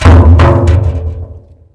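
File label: grass; Mafie 1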